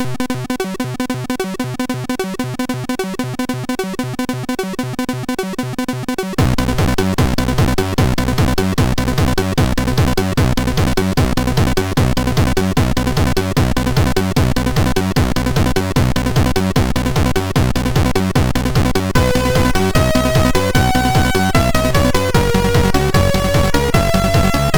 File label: Can Can Dance sid MOS6581R2